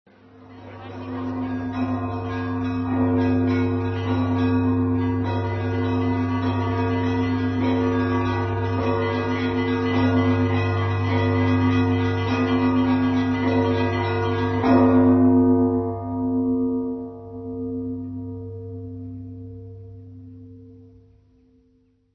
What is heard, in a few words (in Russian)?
Trezvon; duhovnayamuzyka; Russkaya